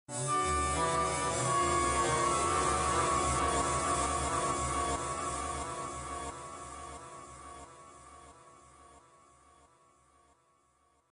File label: menu; Submachine 2